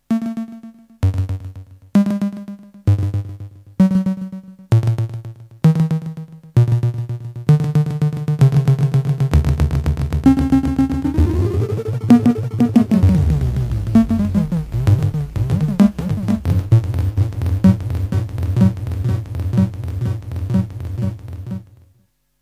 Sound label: fx vco